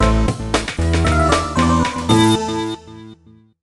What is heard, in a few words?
Win, Clearing a level; MM&FaC; Moonlight Mansion